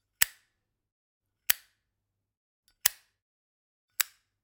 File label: Generic Click Metal Small